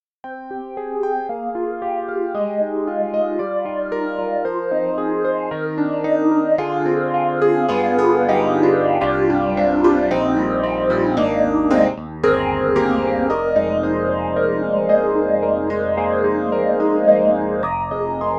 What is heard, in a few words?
Yamaha CP80 Phaser Mouthy Phaser; Steinberg; The Grand 3